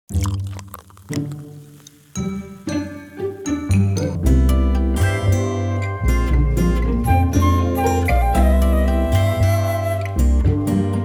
No Percussion; Gourmand Land

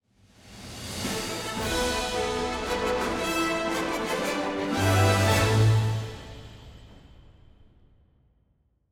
Result [Win] [Victory]; Monster Hunter Rise

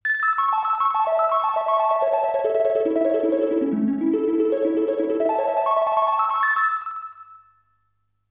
Harp; Europe Sensen; Super Aoki Ookami to Shiroki Mejika Genchou Hishi

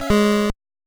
Hisss Meowr!; Nightshade; NES

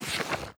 page change

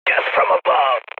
Before Airstrike

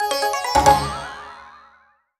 LEVEL UP; Yo-Kai Watch; 3DS

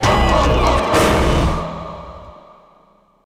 Place Goal Fanfare; La Brea Car Pits - 4th8th Place Goal Fanfare; crash bandicoot series sound box

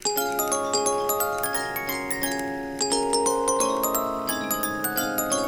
Boxy Jingle; Project Playtime

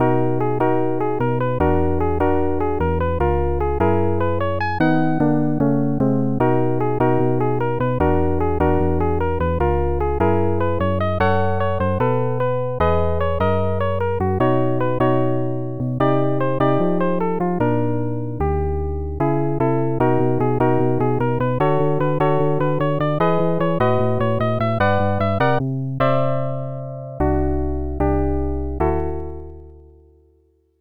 Title Screen, Changing Keys; Wheel of Fortune genesis

